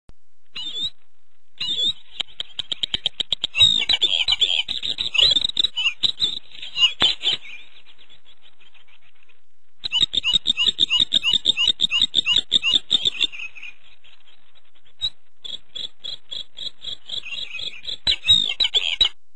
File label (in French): gorge bleue; oiseaux